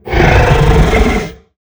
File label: Zombie